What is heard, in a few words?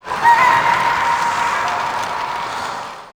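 goblin cheer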